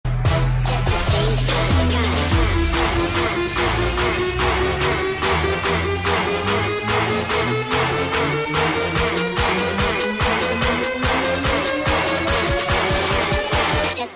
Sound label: bassdrop